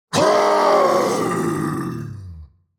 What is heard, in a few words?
Demon king lord long shout